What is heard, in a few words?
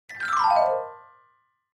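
Clavichord Descending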